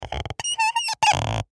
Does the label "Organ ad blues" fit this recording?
No